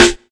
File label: dry snare single hit f key; snare drum samples